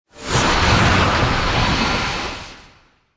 Magical Water Ascend Mysterious

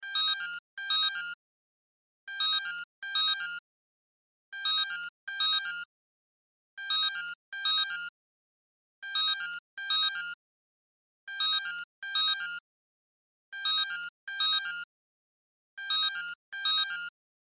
Cell Alarm Chime Loop; Alarms (Sound Effects:Electronics); Blastwave; Technology

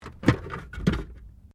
CAR TOYOTA COROLLA GLOVEBOX OPEN, hatchback; 2009 Toyota Corolla; Cars (Sound Effects:Vehicles)

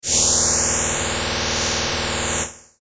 static fast; Static Fast Chopper

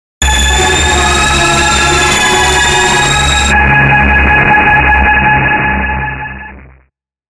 Vibrato Shine Warp; Magic; 5.1 STEREO MIX; Blastwave

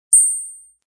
Ping; Pings; multimedia

